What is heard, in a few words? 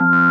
KEY, Org Ham; Club-50 - Foundations Roland; Organs